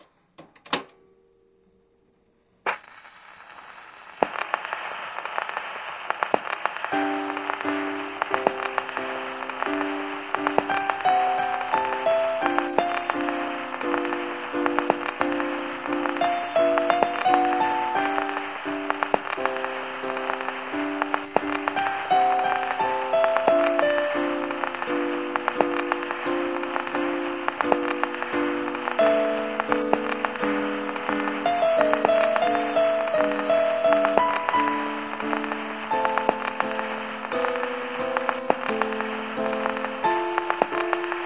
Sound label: Music